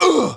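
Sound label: m oof, male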